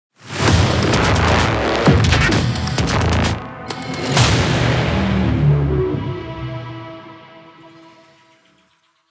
Trailer Jingle BPM130; 4/4; Epic Trailer Instruments

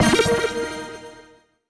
start

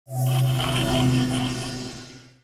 open the demon's gate

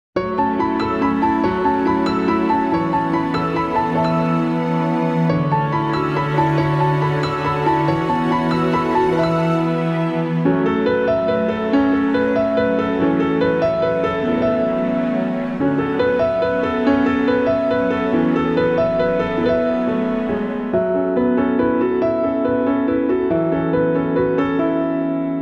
Piano Gbm

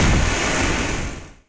attack

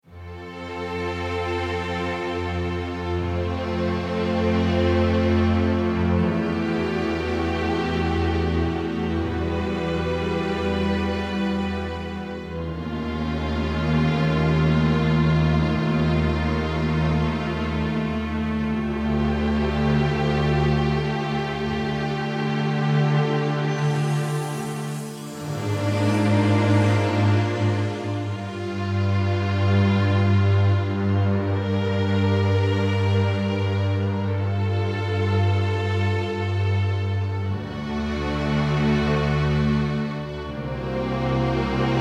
Field BPM80 F

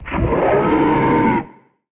monster

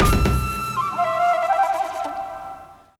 japanese traditional

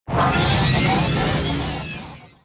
magic wand

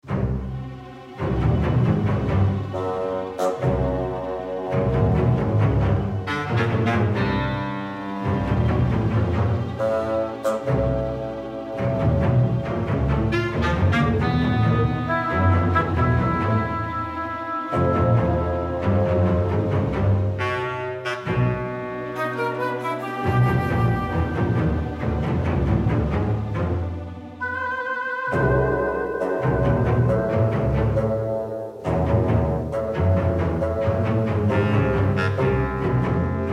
Dungeon BPM68 Cm

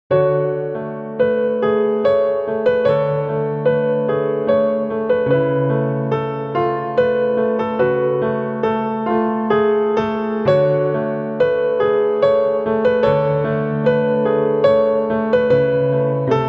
Piano A

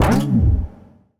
troll

